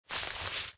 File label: environment zone thorny bush step